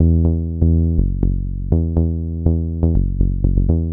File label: 122 BPM Bass Synth A maj F min Tonic E; Pro Bass Synth Studio Kit; MMB